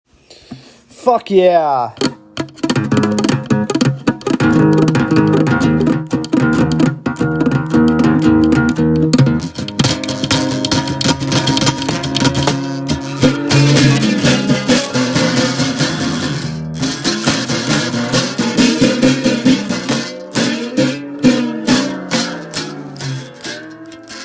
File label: Guitar